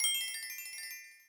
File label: Sound effect of Super Star Bound; NSMBW